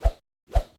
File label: Melee Combat Whoosh